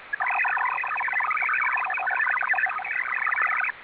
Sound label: MIL-STD-188-141A AMD; MIL-STD 188-141B 2G MFSK